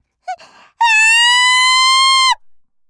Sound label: kyaa; gaya